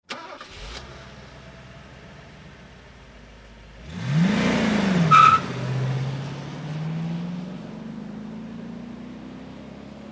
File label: mercedes start and leave